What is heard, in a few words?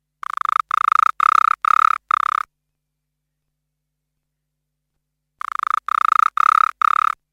Litoria lesueri Lesuers Frog or Stony Creek Frog